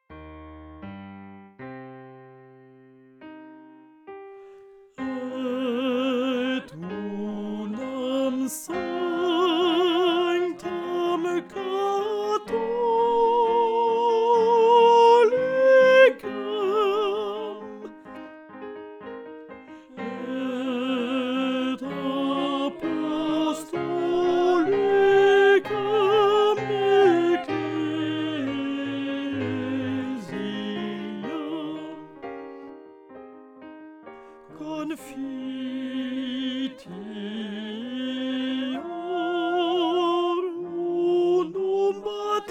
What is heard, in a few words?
alto